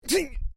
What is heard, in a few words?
MALE VOCALIZATION EEGH; Male vocal sounds; Exclamations; Voice